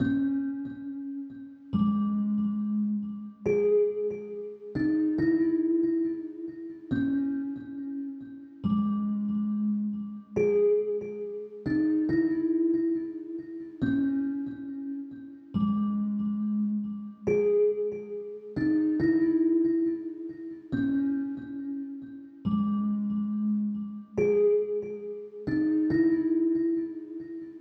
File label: 139 BPM C# Min Bell; Melodies; Loop Stems; Cymatics; Monarch Melodies; Orchestral; The Mist